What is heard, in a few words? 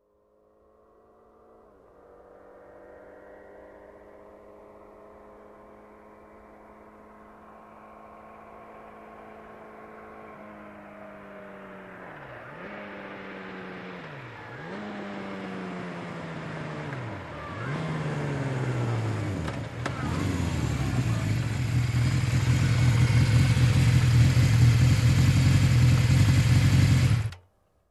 Motorcycle approaching and stopping